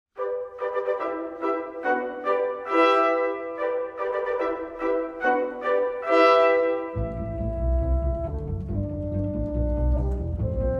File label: piano